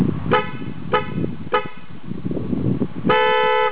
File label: horn